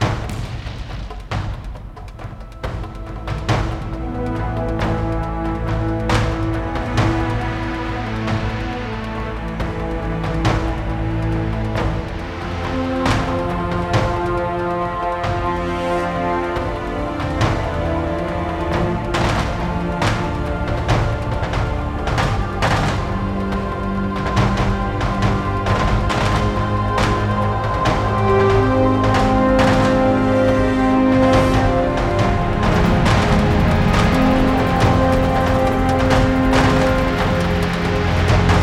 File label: Epic